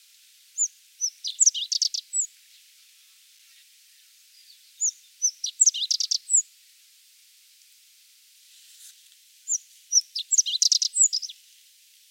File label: Rock Bunting, Zippammer